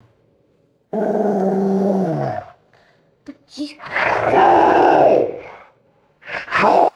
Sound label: young boy simulating tiger growls; animals